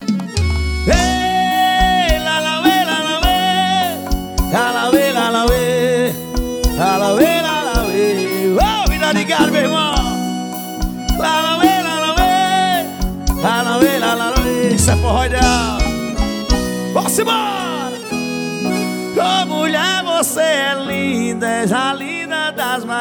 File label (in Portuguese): forro